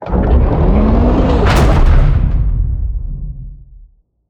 megamek move; skibidi toilet emperor

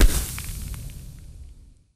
Flare Impact; grabpack upgraded; pp3

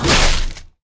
creature hydra attack bite foley; zoochosis; whale

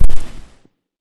catnap footstep; zoochosis pack

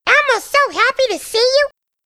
hunter warn; huggy wuggy snpc; elmo

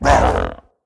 pain, procoptodon; zoochosis pack

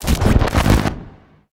Glitch; speaker woman pack

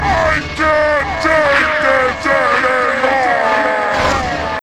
squid chase initial; huggy wuggy snpc

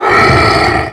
death golem rock; The Monster Plane; kolmods death

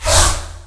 preattack monster; zoochosis; lazynap pack 2

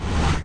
shuffle; pou

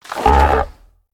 jump, procoptodon; zoochosis pack

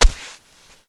step; zoochosis; panda

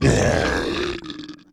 vox death; scrapjack; The Monster Plane; kolmods death

